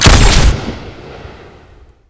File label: fire, sniper; weapons